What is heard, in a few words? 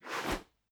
puzzle out